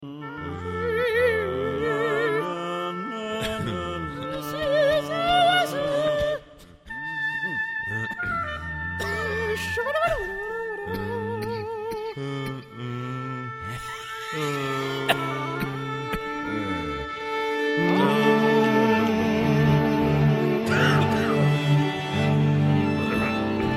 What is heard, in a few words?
tuning